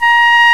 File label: FLUTE, FAIRLIGHT CMI IIX WOODWIND